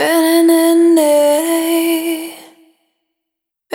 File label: Vapor, Single Voice Melodic Loops; Pocket Diva World Class Vocal Samples